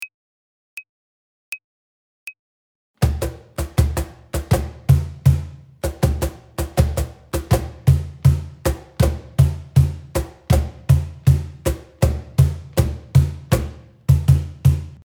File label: Samba Intro